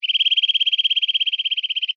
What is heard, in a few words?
bird, ambient animal